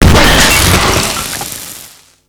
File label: explosion thermite, Explosions